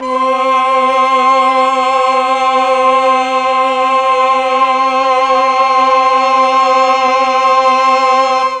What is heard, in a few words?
C4 8VOICE CH, Mellotron; 8VOICE CHOIR; Mike Pinder - Mellotron Archive CD